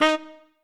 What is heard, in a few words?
Trumpet melo